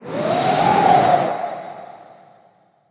blizzard; wolfcub

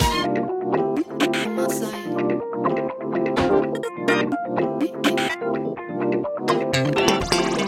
music loop main chord; disco danny

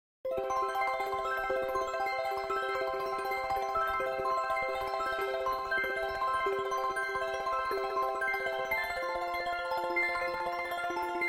spin amb; druids dream

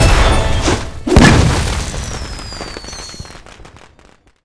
minislot Stop; colossal pinatas

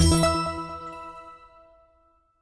alert Win, roulette

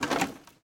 reel start; silverback

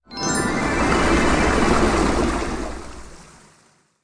Chocolate wheel appears from pond; koi princess

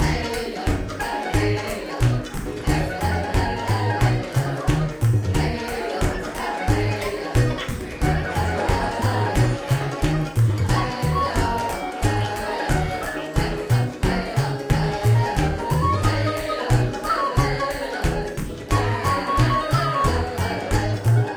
level; goldentavern

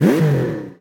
car Rev Oct Up; hotline2

